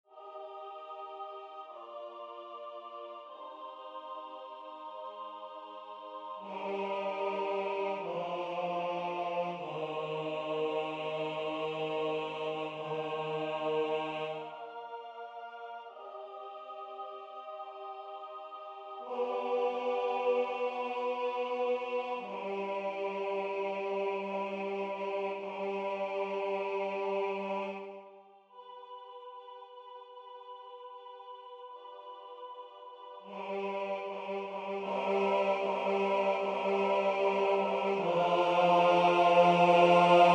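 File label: bass; sanctus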